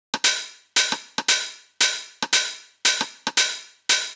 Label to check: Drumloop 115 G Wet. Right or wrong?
Right